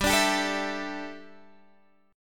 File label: G 6th Suspended 2nd; G6sus2 strummed